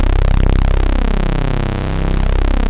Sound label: OB 1 C2, synthesizer; Hollywood Edge - Giorgio Moroder Rare Synthesizer Collection; OB 4 VOX.